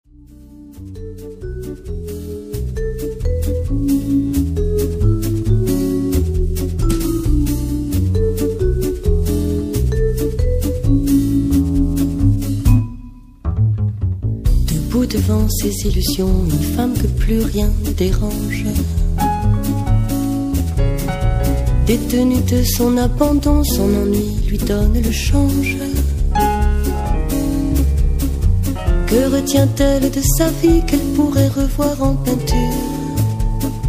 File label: French Cafe